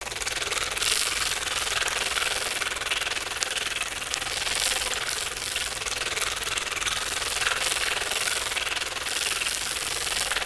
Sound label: Swarm Loop, Infested; Characters